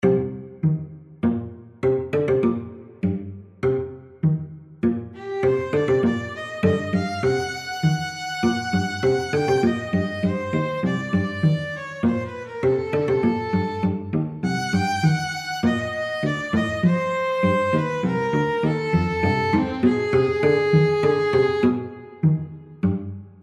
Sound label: music for two allegretto